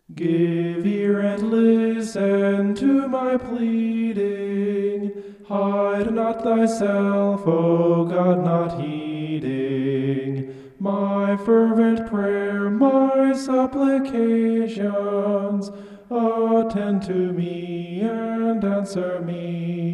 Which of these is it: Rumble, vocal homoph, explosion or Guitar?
vocal homoph